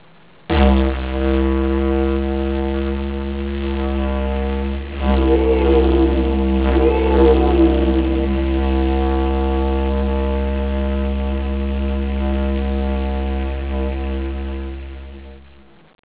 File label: Didgeridoo